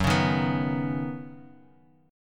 D sharp Minor 7th Sharp 5th F sharp

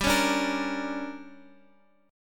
D Major 7th Suspended 4th Sharp 5th, chord

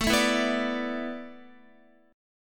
A Suspended 2nd Suspended 4th, Guitar; Asus2sus4; strummed

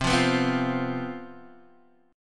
C Major 7th Suspended 4th, C, chord

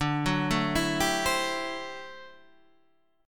D 11th D, down, Guitar, Standard; D11 arpeggiated; JGuitar

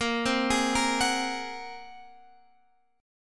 A sharp 7th Suspended 2nd Sharp 5th, guitar chord; down

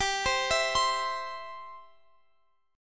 C Major G

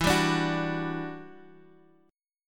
E Minor 9th, Guitar; 11,10,14,11,x,x; 4F,4A,5C,5G,5C,6E; strummed; JGuitar

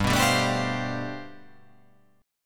G 11th

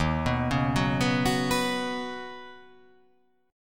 D sharp Minor 7th Sharp 5th D sharp, down; arpeggiated; JGuitar

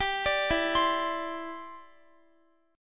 E Minor 7th Sharp 5th, Guitar; arpeggiated; x,x,17,19,16,19; 4E,4A,5D,5G,5C,6F; Em7#5

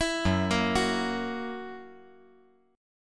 F sharp 7th

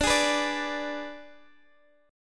Ab Diminished D

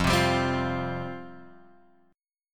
F Suspended 2nd, chord; Fsus2 Chord {1 3 X 0 1 1} strummed

